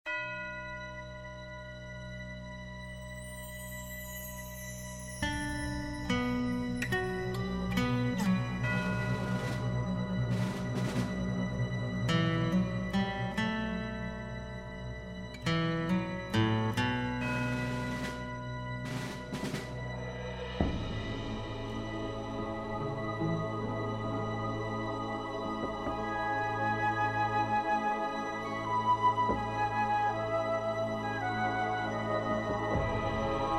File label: Music; Age of Empires III; cinematics